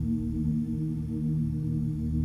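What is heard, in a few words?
ui holograph ambience humanoid loop; Stellaris; paragon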